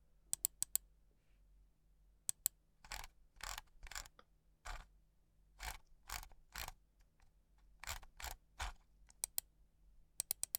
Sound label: Mouse Click And Scroll